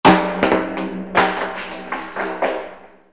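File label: rock slide; KABOOM! - Wild and Wacky Sound Software; outdoors; elements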